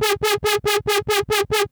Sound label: Ampire G#; Vengeance Samples